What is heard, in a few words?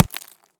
grab ice; Scrat